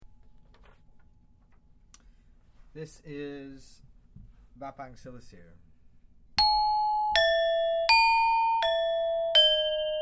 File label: bapang selisir gangsa, gamelan